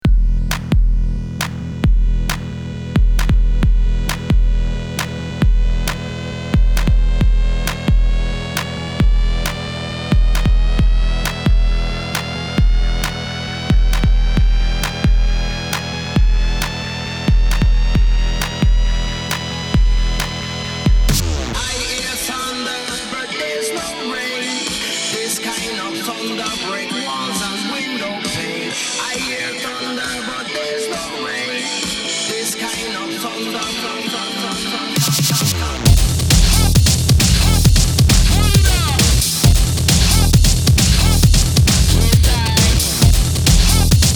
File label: Thunder